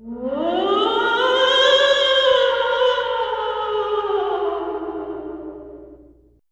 moan

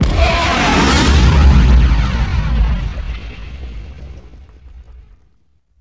explode tie sentinal

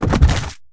bodyfall medium

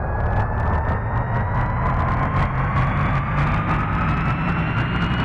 eng accel dmg

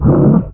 lava flea attack light

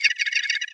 vocalize; flewt

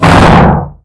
veh object collision

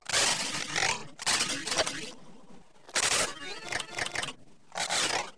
sys manipulation generic

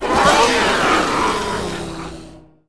acklay attack light

